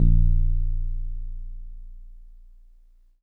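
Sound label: Wood Piano DX100 A#1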